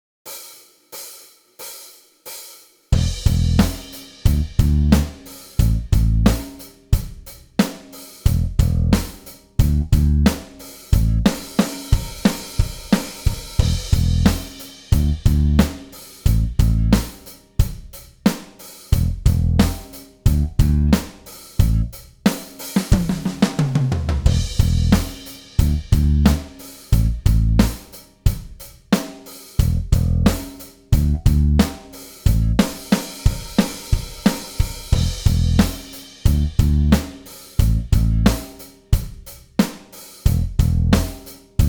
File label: Riff, gitara